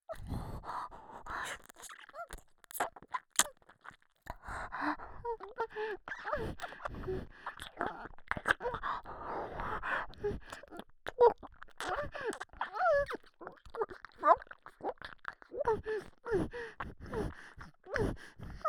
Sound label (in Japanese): ピストン耳舐め, ASMR